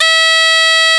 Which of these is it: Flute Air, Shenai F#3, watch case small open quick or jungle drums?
Shenai F#3